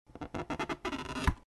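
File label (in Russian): скрип пластинки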